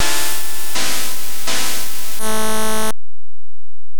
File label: Game Over; ZX Spectrum collection; Future Games